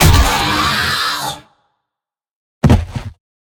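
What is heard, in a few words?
undead golem death; monster; thedead3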